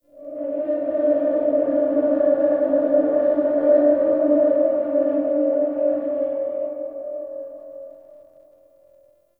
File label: Eerie Drone; Warframe; Ambience; Nineteen Ninety Nine